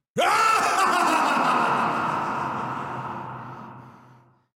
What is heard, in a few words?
Laugh